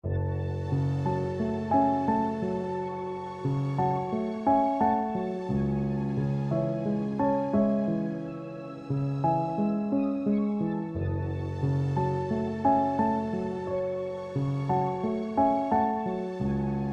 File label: VST Piano Dry